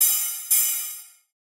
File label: ride